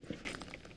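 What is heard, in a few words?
inventory close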